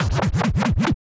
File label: Vinyl FX and Scratches, FX Scratch